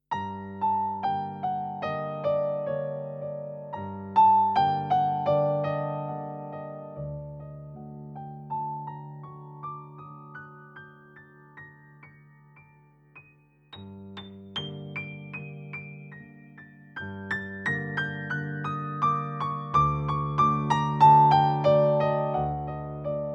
Physis Piano

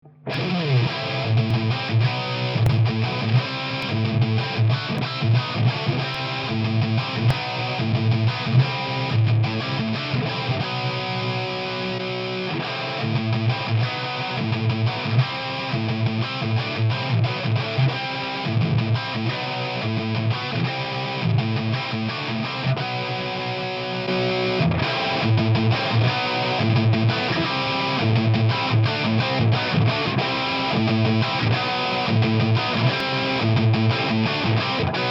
Blackstar Silverline Deluxe Head OD1

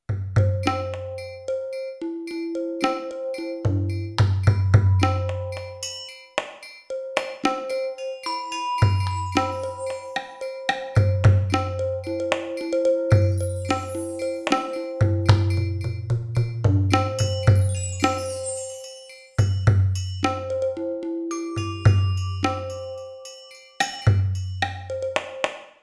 Alesis Crimson II Kit Indian

